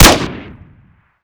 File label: m249; Modern Warfare